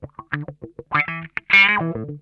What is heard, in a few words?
OUCH, Funk Guitar; Zero G - Funk Guitar